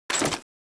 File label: xm1014 draw, weapons